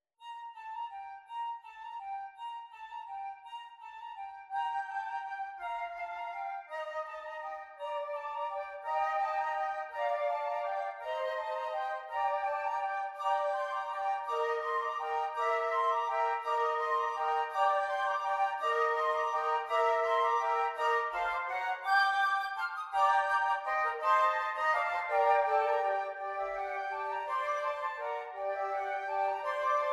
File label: bell carol flute; ukrainian